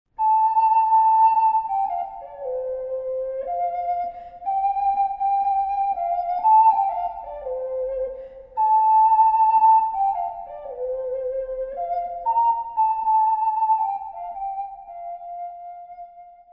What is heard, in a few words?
go tell it, ocarina